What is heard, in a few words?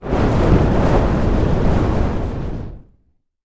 Fire Ball